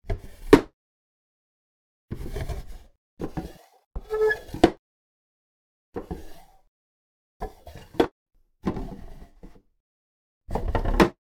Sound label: drawers, household; NS Studios - ultra sound collection